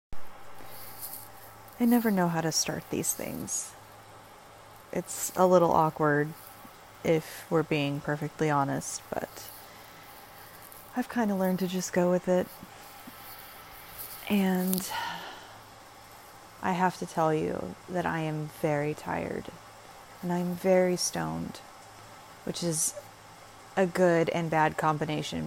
Rainy Day Ramble, loud moaning, moaning, sleepy, relax; [F4M][ramblefap]